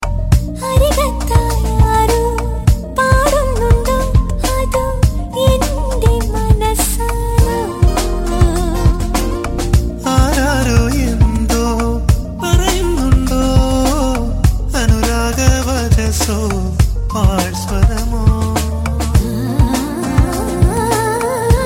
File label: malayalam tune